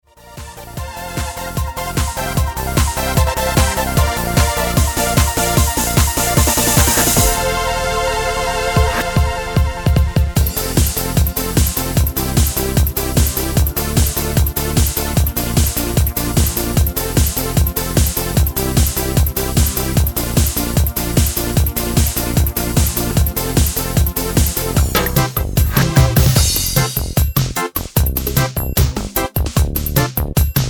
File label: Trance